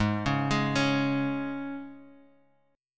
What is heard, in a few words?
Db 5th Ab